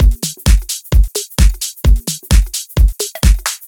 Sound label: Drum Loops, 130 BPM, Underground UK House & Electro